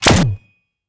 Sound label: future weapons venomspitter mark ii shot; future weapons 3; soundmorph